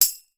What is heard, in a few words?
TAMBOURINES, Percussion; HEADED